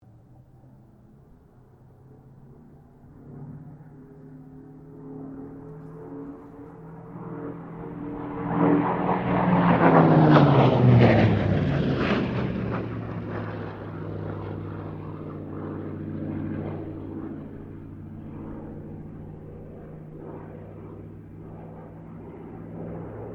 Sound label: AIRCRAFT TROJAN SQUADRON 4 PASS; Mixed aircraft squadrons; Airplanes; Sound Effects:Vehicles